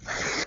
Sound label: rip; plague